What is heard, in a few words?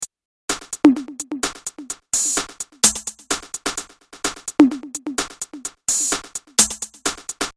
smile perc; Loop Mania Sampler Pack